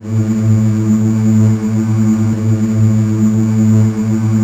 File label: SYN06, Retro Sampler; Best Service ProSamples vol.55; AKAI